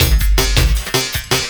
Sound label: LOOP, 160 BPM, Drum & Bass